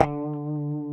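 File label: D 3A, compressed Strat, guitars; Spectrasonics; Hans Zimmer Guitars VOL-1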